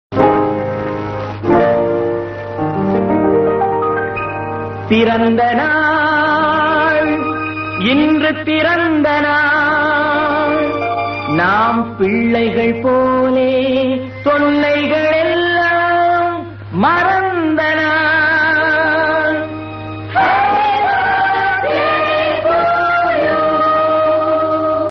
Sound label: old song